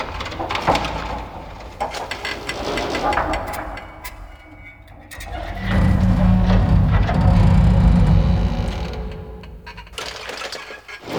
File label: Exterior Metal Creak Loop; Warframe; Ambience; Duviri